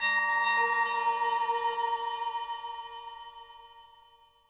Rebloom Bell; Musician

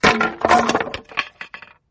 wood sound; Caravan Beast

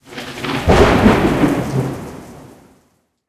Ambient

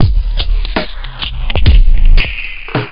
Jingle, Outro; rayman 2

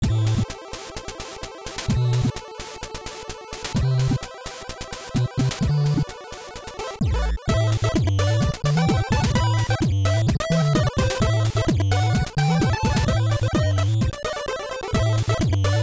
Level Music

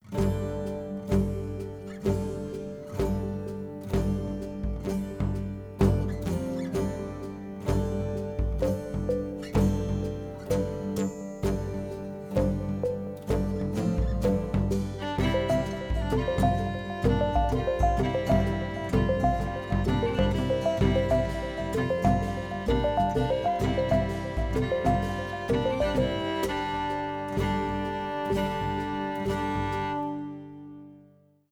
Social Hub Ambient Low

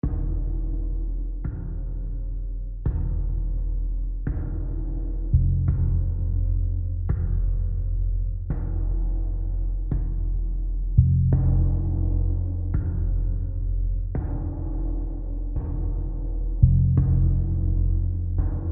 Tension Low

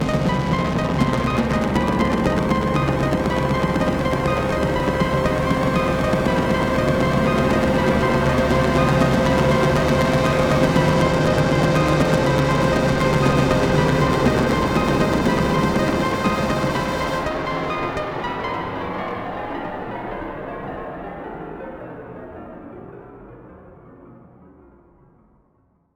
Ear Plug Amb, gamerip

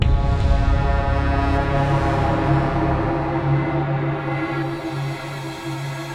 Ice Bossbattle Intro

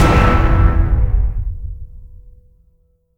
Fail; Prototype 2

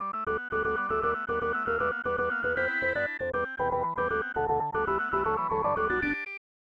Stadium Organ; super bases loaded 2; snes

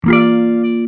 Notes As7 Down; Hylics

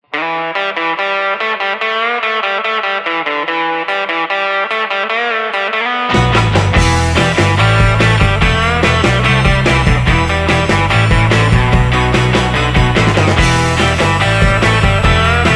Jukebox Surf psychedelic surfer

